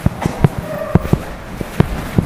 female scream

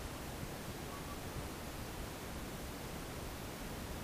whistle

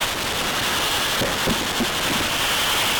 Demonic laugh

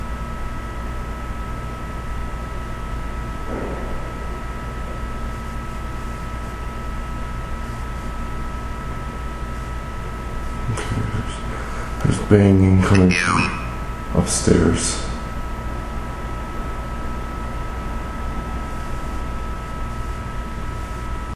old jail bang; CP old jail july 16th

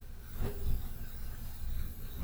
baby noise cleaned up

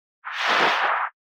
energy draw growl

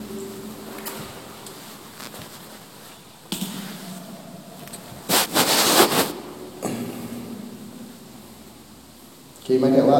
thud then yell

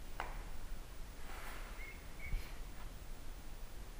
bang whistle

